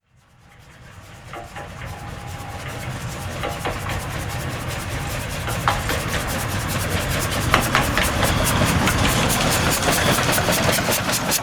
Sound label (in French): trains a vapeur a voie etroite; sonotheque